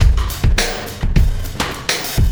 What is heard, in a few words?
Sky Lab Beat, Processed Drumkits